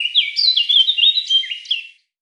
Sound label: forest birds singing